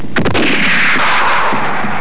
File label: blast